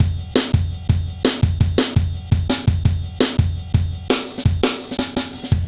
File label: heavy live ride, drum loops